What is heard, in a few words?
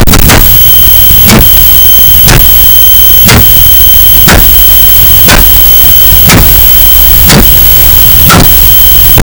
time scroll, time travel